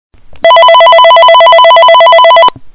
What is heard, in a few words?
Loud Ring